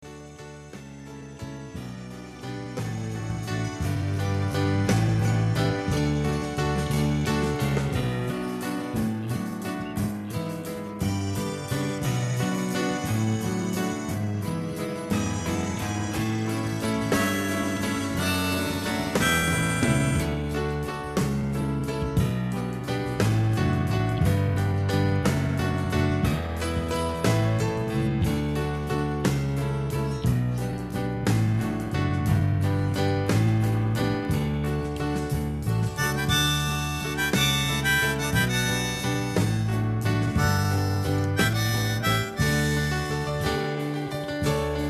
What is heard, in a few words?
piano